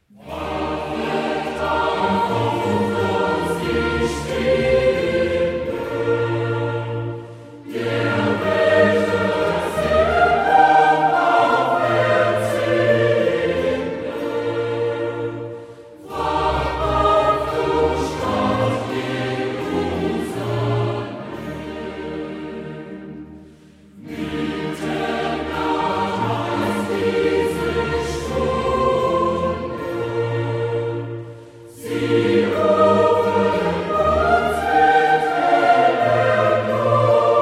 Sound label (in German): Chor